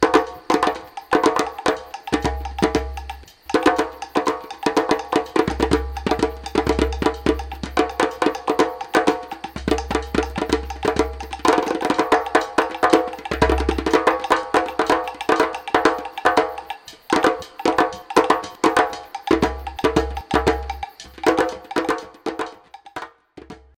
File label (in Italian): percussioni